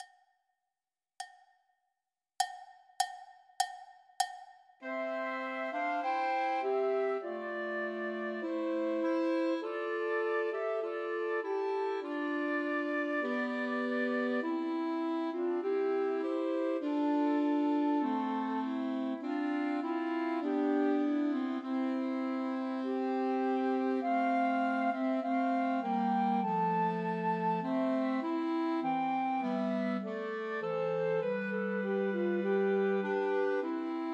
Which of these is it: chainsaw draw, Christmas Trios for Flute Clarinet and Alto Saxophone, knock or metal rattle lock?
Christmas Trios for Flute Clarinet and Alto Saxophone